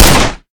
minigun; ML-Agents; FPS Game

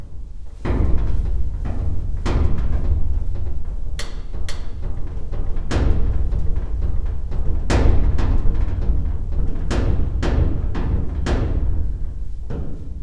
passage amb fx metal shake; Ambient

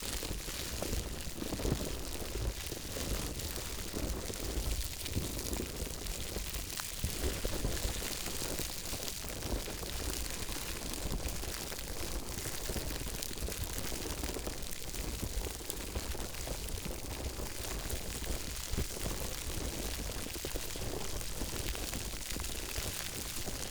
Campfire

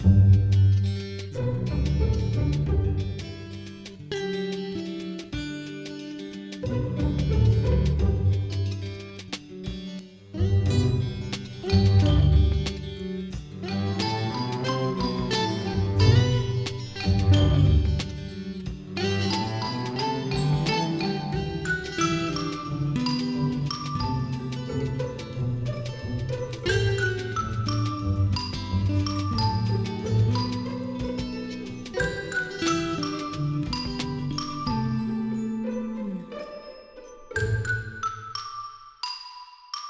MenuTheme; LoadyDungeons

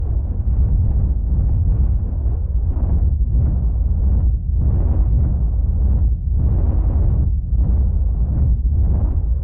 Black Hole Generator Black Hole

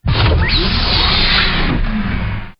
Whiss; SpaceshipDemo

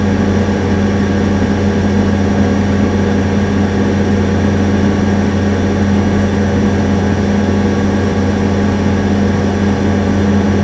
room amb compressor; Ambient; SpaceshipDemo

